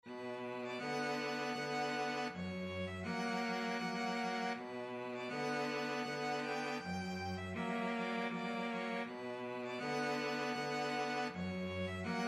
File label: violin cello duet